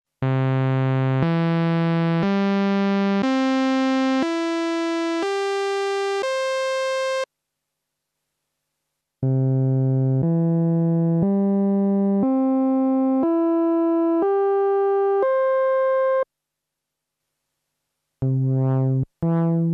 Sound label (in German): subtraktive synthese